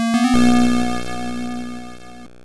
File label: Level Start; prince of persia; master system